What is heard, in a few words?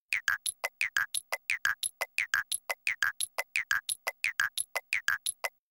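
Cartoony Machine Loop, cartoon gadget fx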